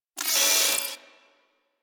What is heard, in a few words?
Turret Harness Movement; Warframe; DE; Vehicles; RailJack; RailJackTurretHarness